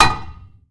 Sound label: tank hit small; tanks; War Thunder